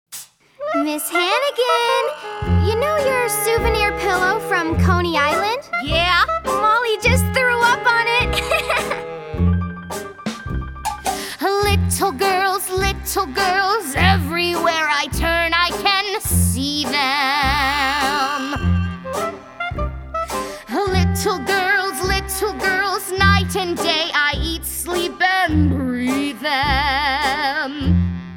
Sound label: guide vocals